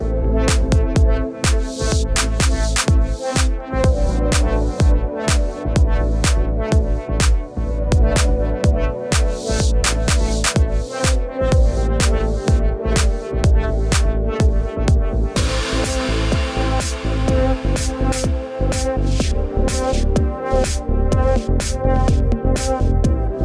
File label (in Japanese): EDM爆弾; dark sound